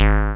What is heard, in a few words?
Sequence Bass